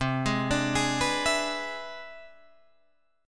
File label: C Augmented Major 9th C down Guitar Drop D